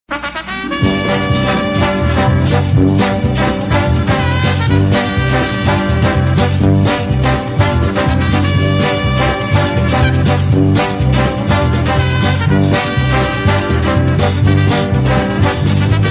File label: car music